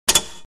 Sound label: button, knappar på - av-ljud